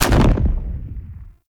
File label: Gatling Gun Start Stereo; Warframe; Tau Gatling Gun